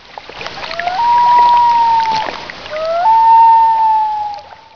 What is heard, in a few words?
loon